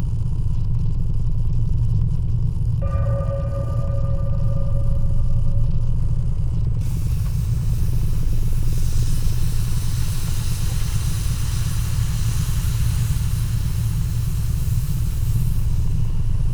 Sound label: ship science ship idle; Stellaris; toxoids